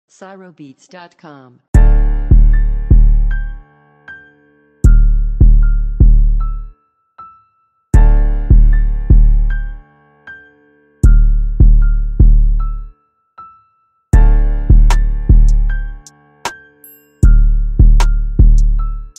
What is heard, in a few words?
Type Beat